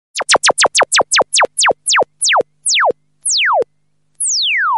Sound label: laser beam notification tone